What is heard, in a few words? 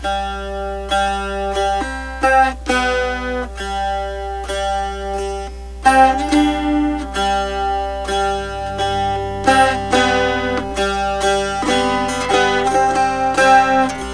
Dulcimer